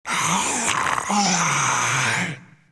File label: gorefast idle; kf2; gorefiend; slender